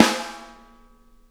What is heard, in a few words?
Interactive Drum Kits